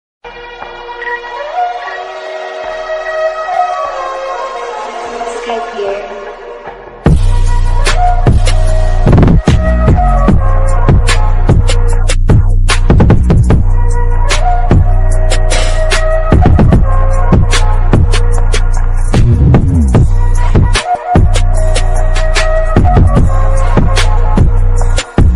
bass boosted attitude ringtone english ringtone bgm music